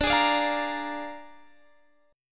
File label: Bb 7th D, chord